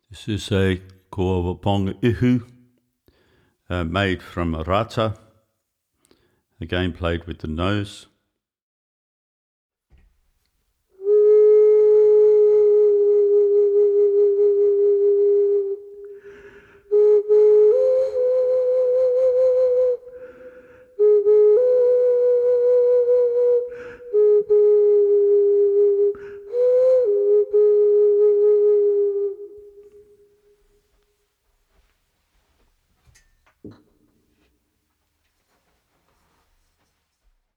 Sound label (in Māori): Koauau Ponga Ihu Rata